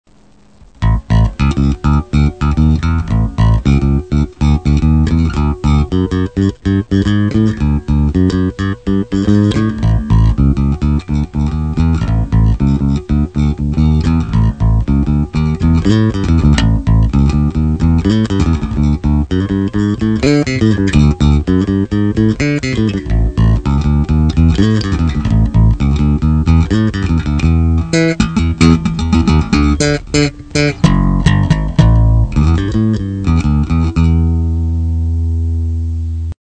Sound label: Bassgroove